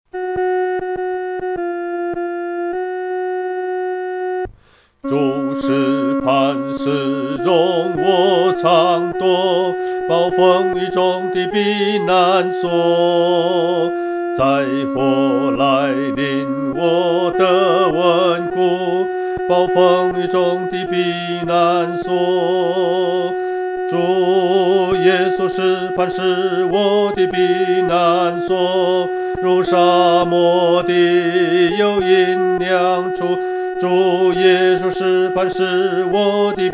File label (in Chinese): 独唱 第二声